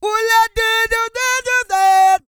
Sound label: SING, World Voices, CARIBBEAN